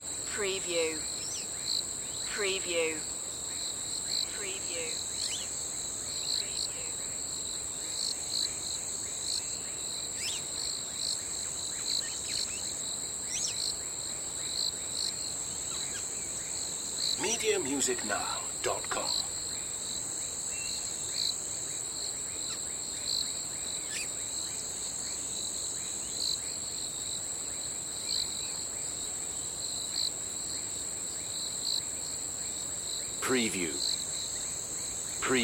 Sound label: crickets and birds